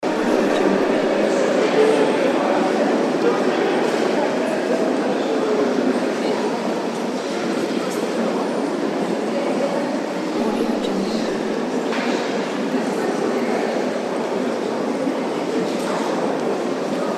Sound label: crowd in hall